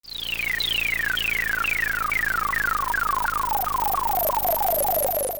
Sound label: Sci fi alien radio transmission